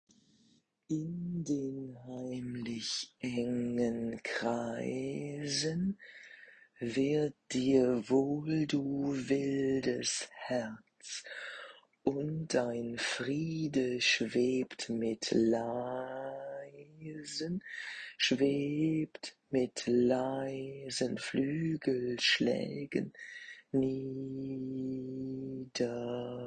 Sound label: Alto; Wald